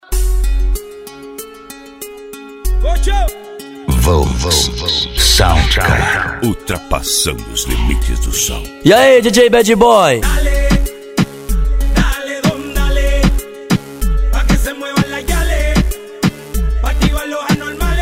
sound car reggaeton; cd volks sound car

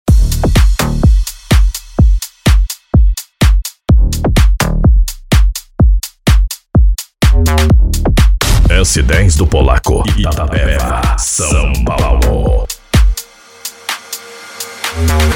electro funk

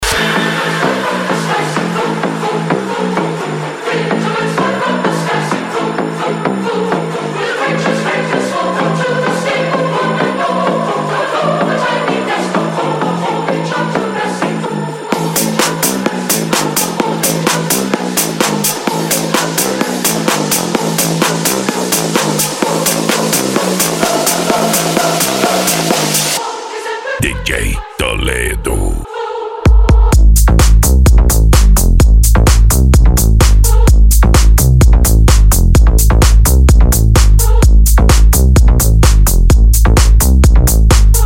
open bass, Eletro Funk

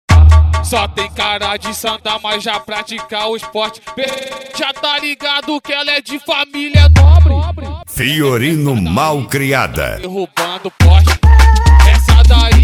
mega funk bass

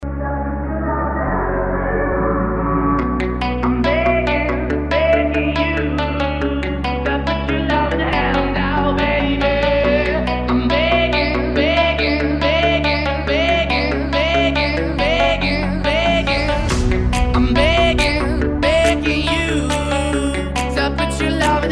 deep house

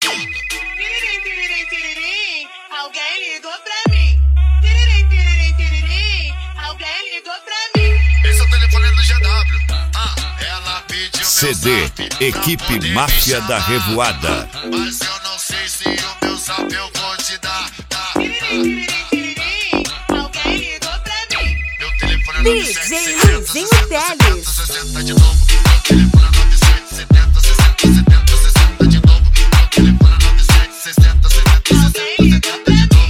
mega funk; equip mafia da revoada

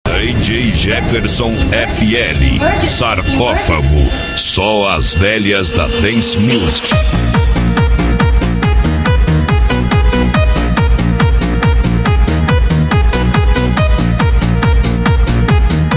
dance musik

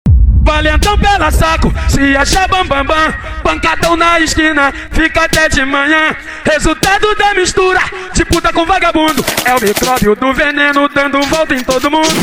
extreme bass; carreta

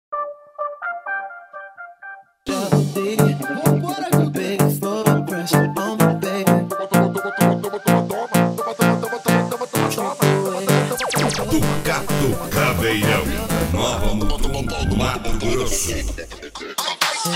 especial mega funk

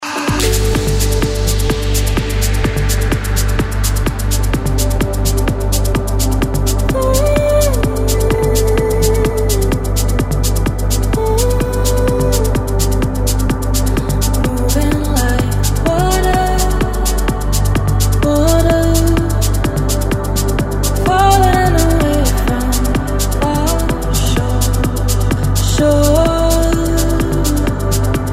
progressive eletronic music

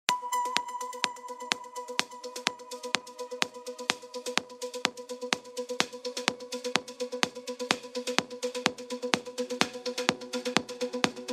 progressive techno house

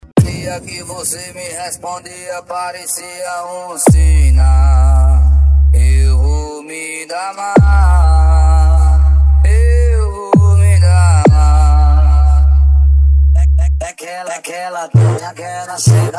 mega bass

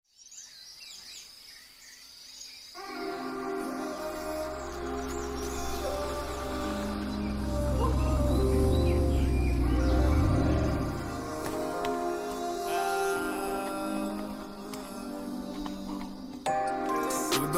hip hop graves bass

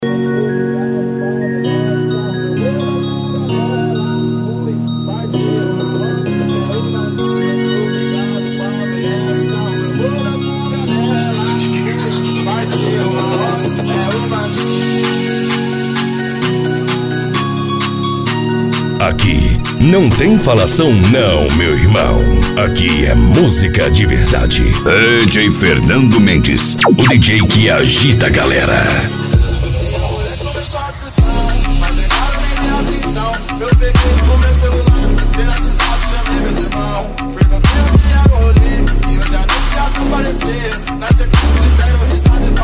trap funk

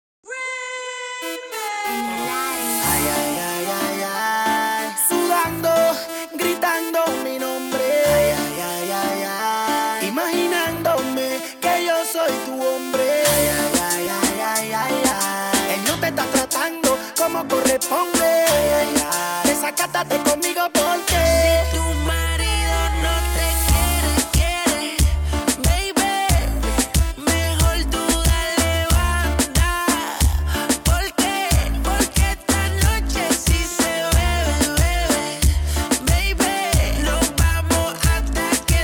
reggaeton mix; reggaeton mix evolution

